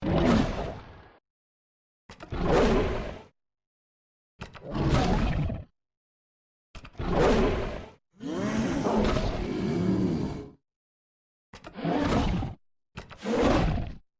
t rex bite

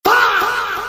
fahahhahah

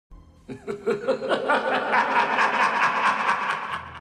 laugh; palpatine

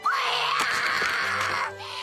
scream; cocoa

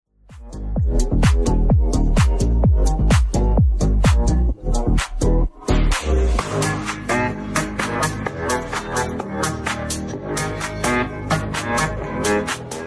disco

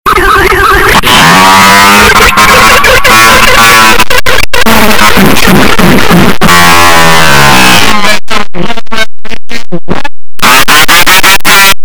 earrape brain fart

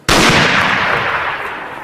bang

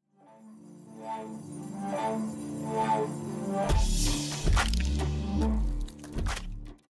wobbly wobble

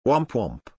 womp womp

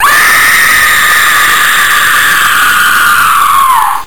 scream no echo; sonic exe

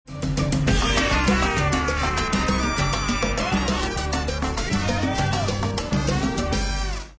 transition

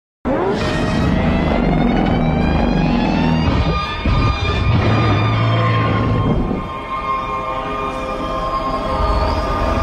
ghidorah alpha call

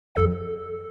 windows longhorn ding

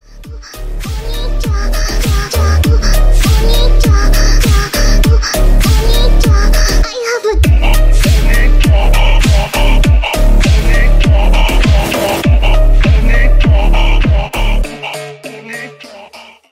dubstep

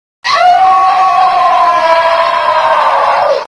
scream deep; luigi

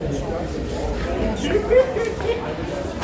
eheheheheehehehhe

